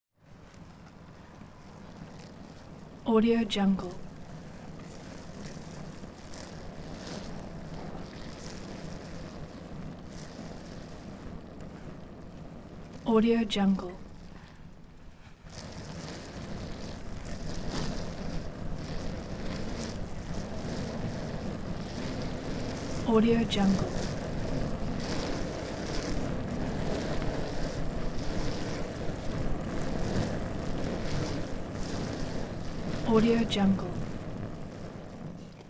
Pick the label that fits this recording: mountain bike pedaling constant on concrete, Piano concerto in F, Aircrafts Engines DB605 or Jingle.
mountain bike pedaling constant on concrete